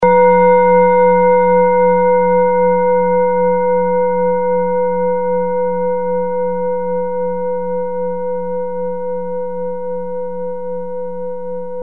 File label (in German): orissa klangschale